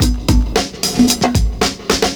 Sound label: PERCS, 70 s Breakbeats